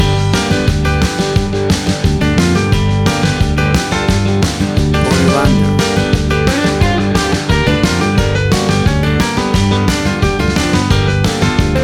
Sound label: Jukebox Country Shuffle Rodeo Music